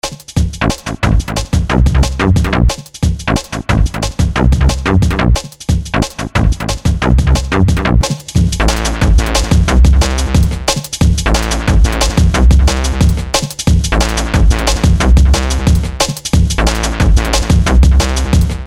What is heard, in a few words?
DNB